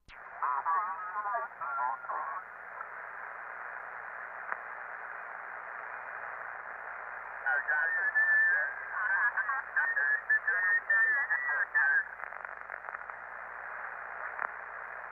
Futz Radio Radio Stat, static voices; Futz Radio: Radio Static And Warble. Mono

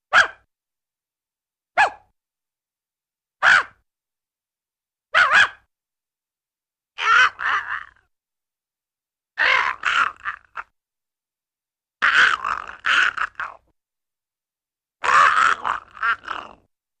Funny Sm Puppy Plays

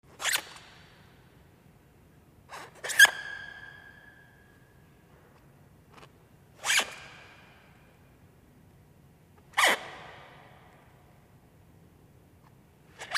Shoes Tennis Cu Squea, racquetball

